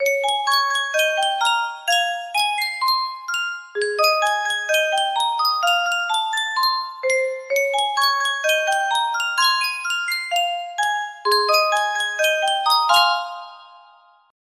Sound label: yunsheng custom tune music box